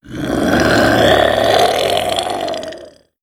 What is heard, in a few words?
hunter stalk, zombie vo; Left 4 Fortress